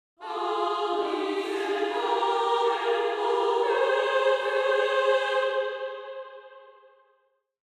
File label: Angelic choir angels; unleash the power of heaven